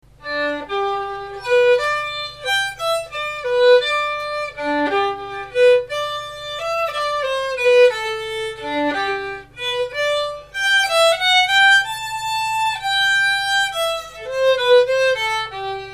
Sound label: jigs, violin; adult violin